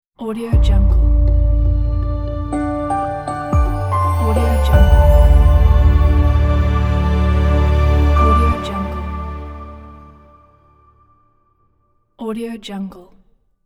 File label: elegant corporate logo; emilnilssonmusic